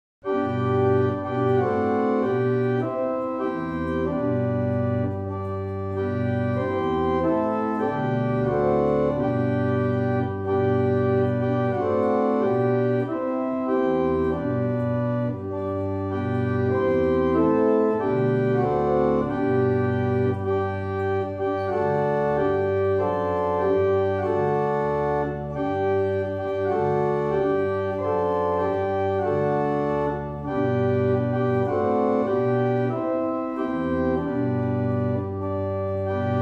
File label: Spanish Hymn, barrel organ; Brightwell